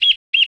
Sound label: bird; Virtual Pool Tournament Edition